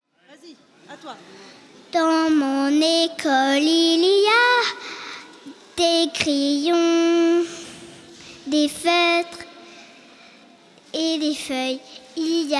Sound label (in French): Chant Marche Noel